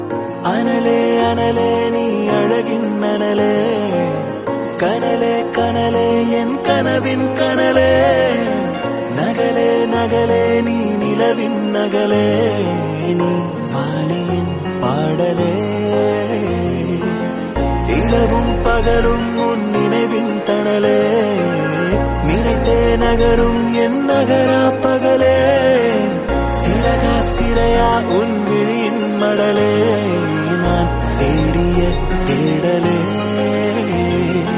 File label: Love New Tamil